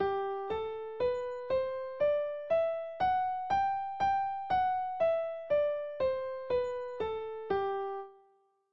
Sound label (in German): Tonleiter G Dur, klavier